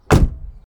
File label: Table hit Mono Bip